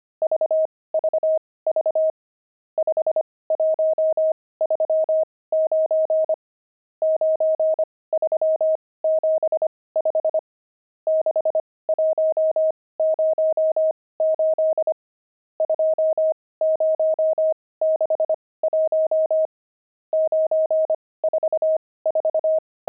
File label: pangram, intermediate pangrams files